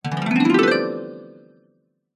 fantasy magic wand spell classic harp glissando fairy; zapsplat